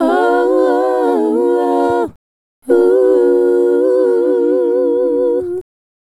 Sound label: Female Group, Hip-Hop and RnB Vocals